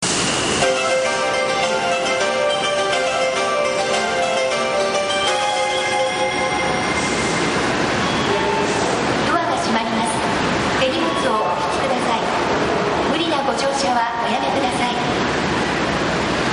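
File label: ikebukuro 5 departure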